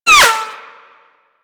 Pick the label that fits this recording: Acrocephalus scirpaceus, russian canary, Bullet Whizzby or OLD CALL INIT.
Bullet Whizzby